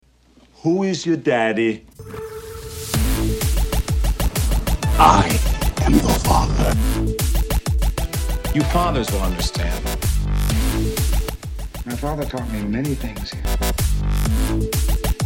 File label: Podcast intro music